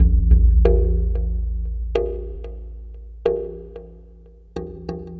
Tribal Wave Drum; Sonic Foundry (Sony Creative Software); Ma Ja Le Ethereal Textures; Worldly Rhythms

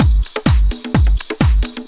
Latin Loop 128 BPM

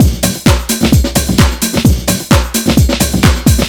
DRM, House, LOOPS 130; Best Service ProSamples vol.10 - House; AKAI

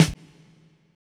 SDA, Disco Fever drum samples; Best Service ProSamples vol.48 - Disco Fever; AKAI

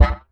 Korg, FX; Back In Time Records; Korg Universe VOL-1; FX FAMILY

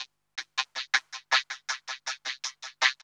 Twisted Guitar; Zero-G Smoke

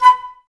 flute, Wood Winds, Flutes

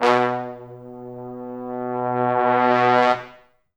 Pop Brass; Best Service ProSamples vol.19; AKAI

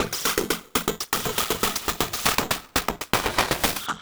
Beat, Industrial; Sonic Foundry (Sony Creative Software)

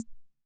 Ace Tone Rhythm Ace Perc, Drum Machines; 1967

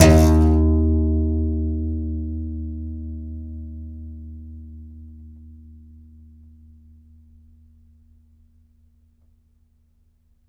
C. Bass Balalaika, Plucked Strings

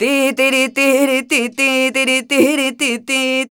voc c, Flamenco, Vocals, Female Cantaora; ProSamples vol.46

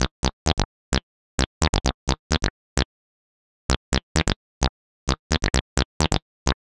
130 Cm bass, bass loops, trance; Trance Explosion Vol1

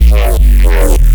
Reese; neuro; BIG5AUDIO

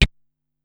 "Tchh", Korg Wavestation-SR Drum Kit

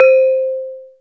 saron; SN-R8-07 Mallet

